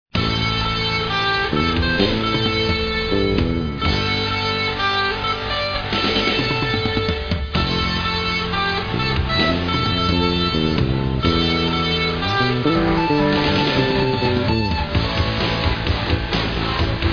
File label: Corporate Heavy Metal